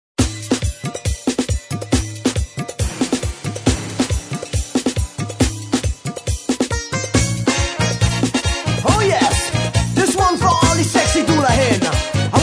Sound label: dhoula